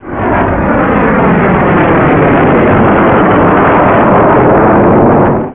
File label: plane